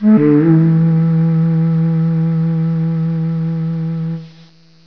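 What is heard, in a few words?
shakuhachi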